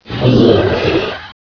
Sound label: charger deny; mayan